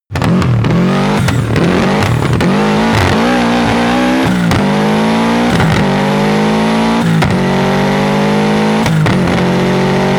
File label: car subaru impreza long accelerations deceleration